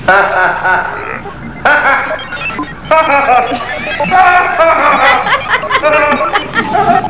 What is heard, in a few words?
computer laughing; Spaceship computer learning to laugh; from the Flight of the Navigator movie